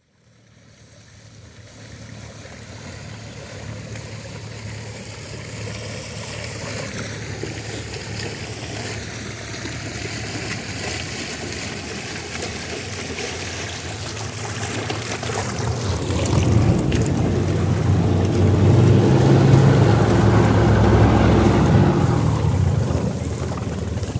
Cabin Cruiser, approach & stop (Recorded on shore) (27ft, 50hp diesel engine); Boats; BBC